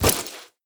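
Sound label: throw, grenade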